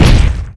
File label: step; Armored Speakerman TITAN